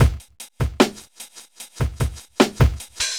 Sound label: hiphop